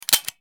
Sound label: Gun Hand Click